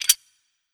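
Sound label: Human Hunter Mecha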